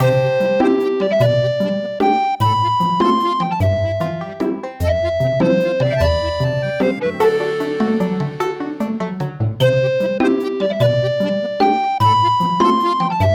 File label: Town Peaceful Loop; Sparklite